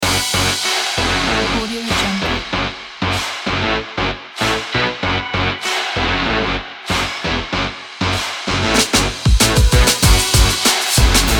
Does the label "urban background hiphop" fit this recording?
Yes